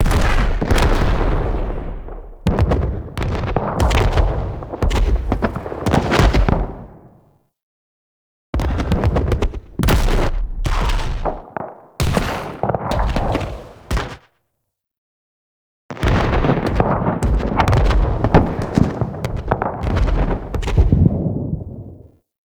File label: Moon Intro Battle Explosions; The New War; Cinematics